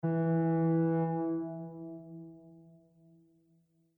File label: Ship Horn Distant Short; Boat Sound Effects